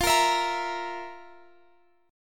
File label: F Major 7th Suspended 4th; strummed